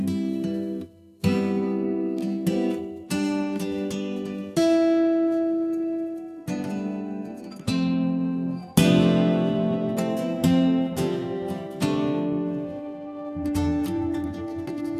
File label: smooth guitar ballad; musicgen